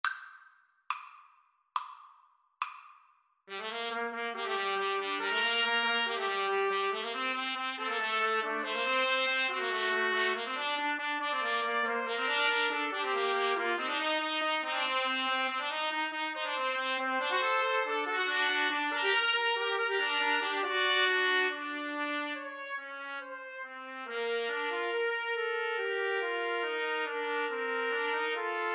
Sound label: TPT trio, Trumpet Trio